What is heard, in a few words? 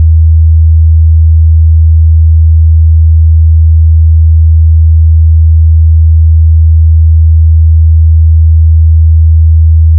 80hz; 48k